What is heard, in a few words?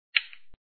bang; ballsnwalls; Flash5